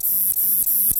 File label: song, katydids, neduba; steindachneri